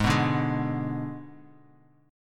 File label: G sharp Diminished G sharp, Guitar; G#dim strummed; JGuitar